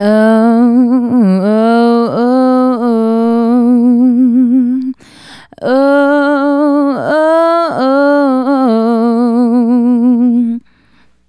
Female Solo, Vocal

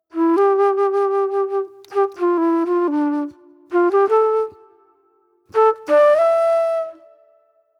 Sweet Soul Flute

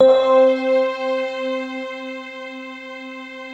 Fender Violin, Fender Stack; Optical Media International; Sonic Images Library

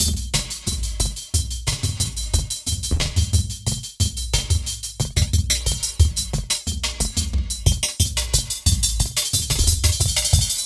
squarechops 090bpm, downbeat dance grooves; Zero-G

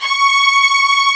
STRINGS C#6; AKAI S-Series CD-ROM Sound Library VOL-2

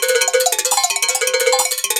BELL, Electro Perc; E-MU Formula 4000 Series Vol. 3 – Analog Odyssey

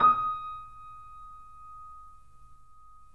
3D Pianos Yamaha C7; E-MU Producer Series Vol. 5

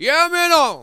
VOX SHORTS; Megabass Remix! 2

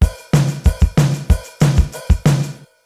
Hard Drum, Yamaha DD-35

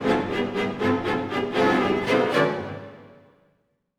Orchestral Loops

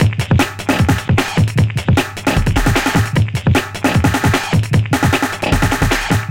drums, Hardcore Breakbeat 152 Dmin; Big Fish Audio; Hardcore Breakbeat Action; Gone Insane

